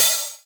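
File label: Open Hihat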